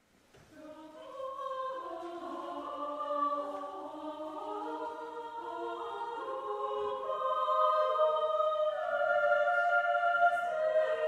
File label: canone alla seconda per coro a 2; Carlotta Ferrari; Gallo canente